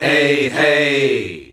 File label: "ehey" D, MALES; Best Service; Hyper Dance